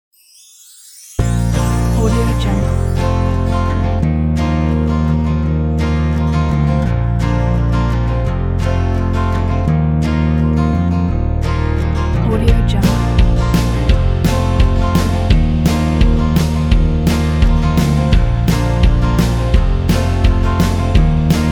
joyful bells and quirky mandolin

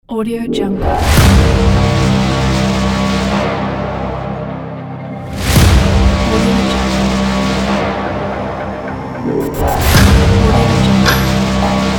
epic trailer ident

